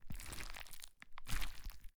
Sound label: gather meat